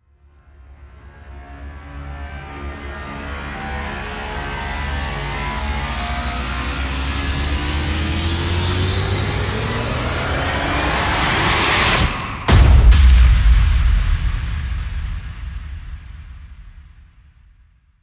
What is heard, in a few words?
Rise Long Material Reflection